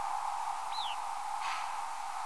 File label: Possible Bicknell s Thrush; ETNA NY